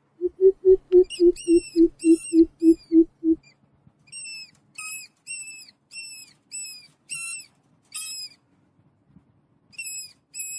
tieng bim bip keu tieng chuot

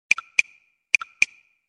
Nhac chuong tin nhan blackberry click

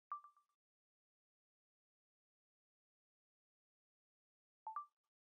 am thanh bat dau dung quay video tren iphone

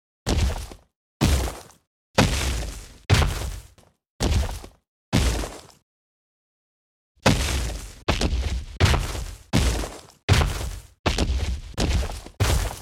Tieng buoc chan cua quai vat khong lo di bo

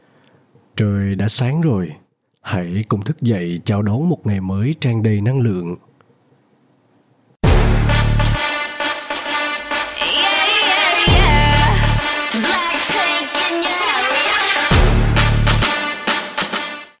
nhac chuong bao thuc tiktok

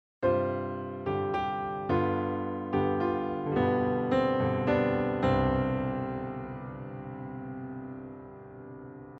Nhac nen ket thuc video nhe nhang em diu

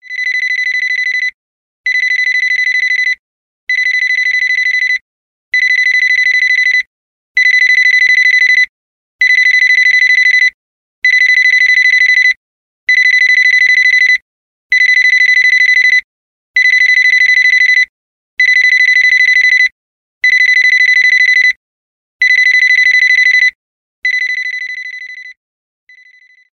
nhac chuong bao thuc huawei

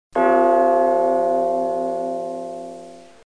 Tieng chuong chua ngan xa buon te

